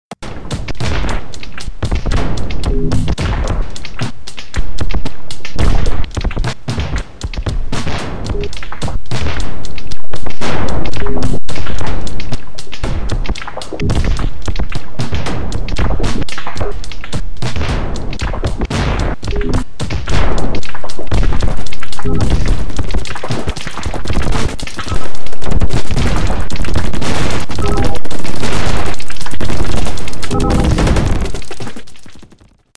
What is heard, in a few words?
glup beat